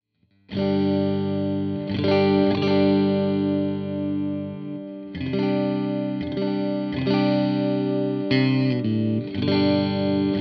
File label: Turk Middle Neck Clean, pickups; Revel